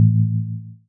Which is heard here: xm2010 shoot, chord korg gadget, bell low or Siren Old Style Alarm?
bell low